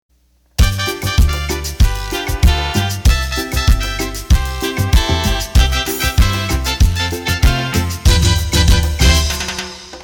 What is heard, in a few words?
Guaracha